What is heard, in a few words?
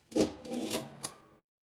mechanical lever